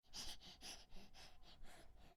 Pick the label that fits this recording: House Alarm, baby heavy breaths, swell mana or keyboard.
baby heavy breaths